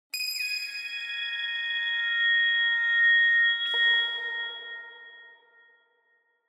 Harmonic Pad Harmonic Plucks Harmonic Pad - Short Very High Pad With Some Pizzicato; Hollywood Edge; sound designer's toolkit v2